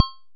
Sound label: impact Metal heavy; Kenney's Sound Pack; Impact Sounds